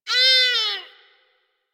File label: Forest Animal Cries; Warframe; Ambience; Grineer Forest